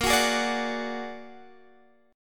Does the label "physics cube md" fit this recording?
No